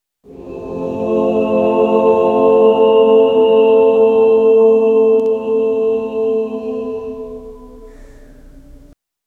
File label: souls of the dead softly